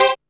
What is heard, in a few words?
dance chord